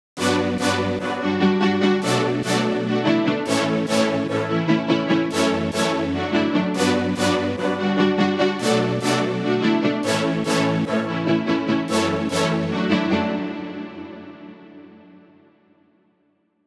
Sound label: Drop Chords, 146bpm; Remix Stems